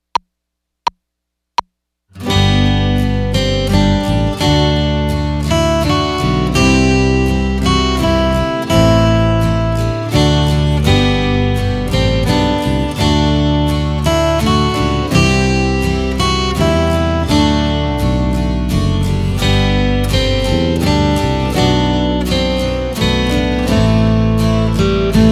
Catalonian Song

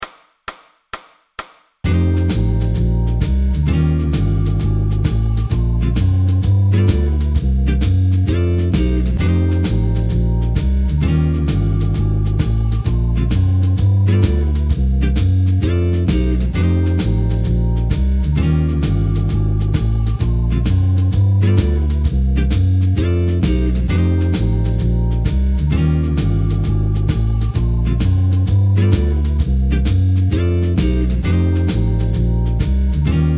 Major Solo Last Four Bars

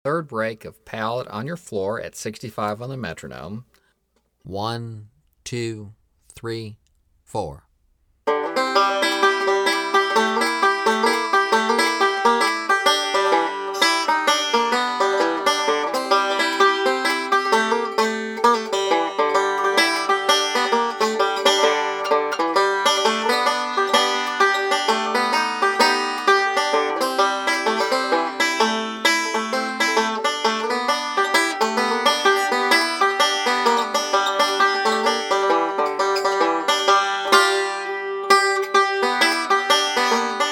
Pallet On Your Floor Break 1, 65 Bpm

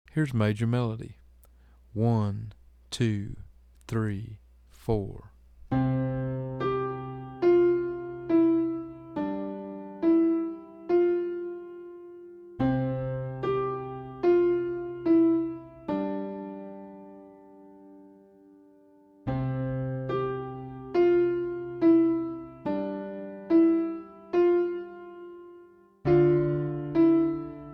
Major Melody Slow

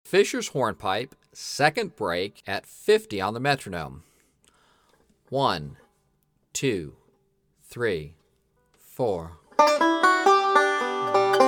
Break 50 Bpm; Fishers Hornpipe

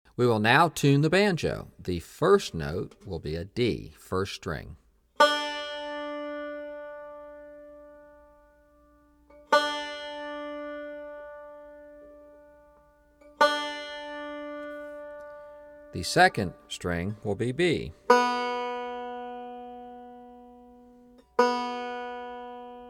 Tuning The Banjo

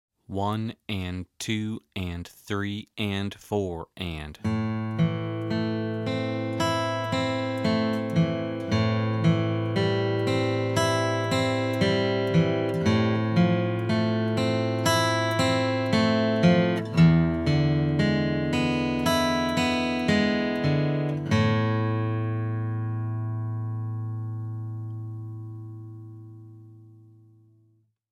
Arpeggios

